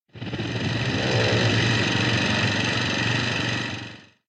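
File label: electronic indoor plane flight; anemoi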